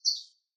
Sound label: Bird Chirp; SoundsCrate